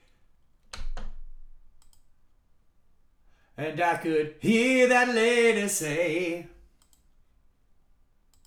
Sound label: vocals